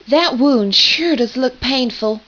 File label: wound pain; wanted